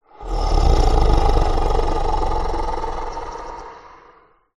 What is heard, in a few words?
horror creature growl